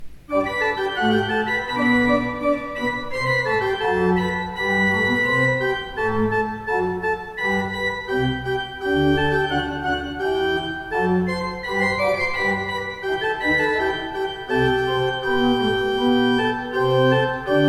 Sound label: pipeorgans